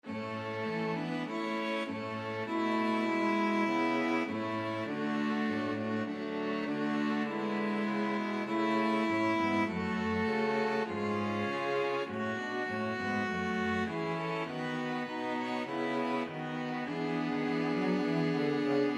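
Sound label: national anthem, string quartet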